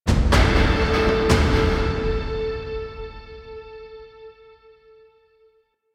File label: Rank Up Cue, Music; Warframe; Veilbreaker